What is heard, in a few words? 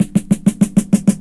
DRMROLL; E-Lab; X Static Goldmine 2; akai